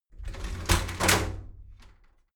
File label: shower slide door inside open heavy